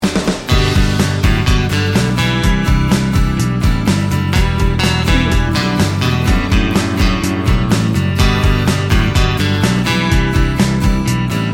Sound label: americana soft rock